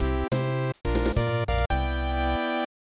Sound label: Birdie